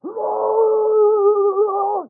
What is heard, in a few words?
Executioner Attack Vocals